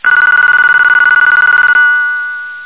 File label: Ringggggggggg